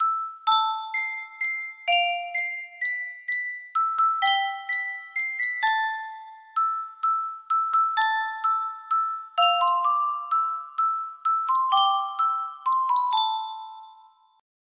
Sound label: sankyo custom tune music box